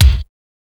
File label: bright steel kick drum c key